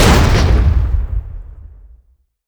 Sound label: Hit Med, Damage; DCS